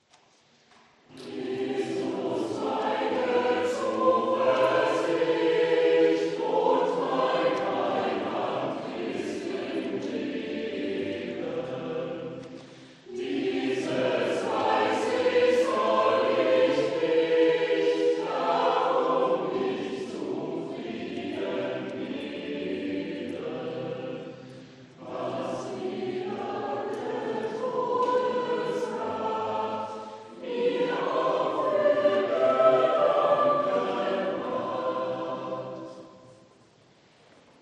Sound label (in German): Chor; Jesus meine Zuversicht